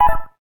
gayageum, keytone

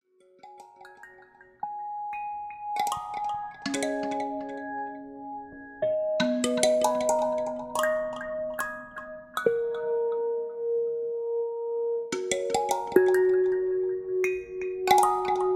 Moonlight Blue, alarms; LG K83 5G

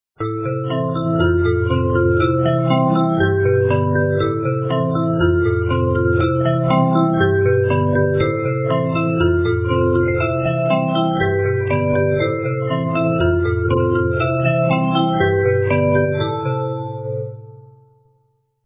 Armonic Bell; NIU N109 Niutek; ringtones

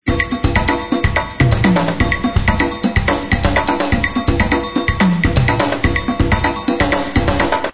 Timbale Taps, ringtones; Motorola A1000; A1000-CD

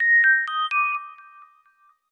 Shooting Star, notifications; LG Stylo 2 LS775

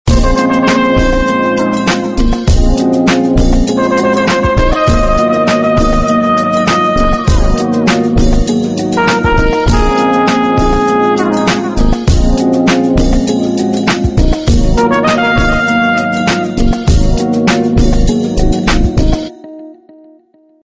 Chill, Samsung; SGH-A777

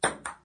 Ping Pong, notifications; Infinix XOS 15

